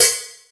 open hat sound c key, Royality free open high-hat sample tuned to the C note. Loudest frequency: 8802Hz